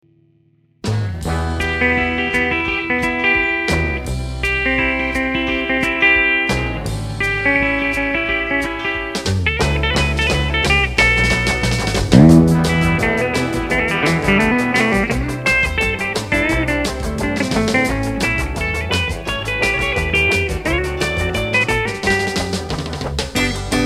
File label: instant guitar